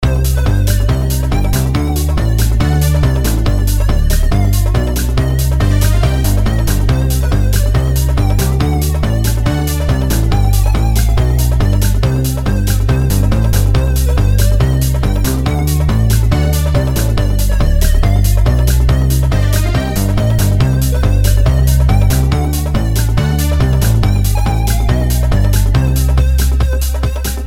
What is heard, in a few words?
Remix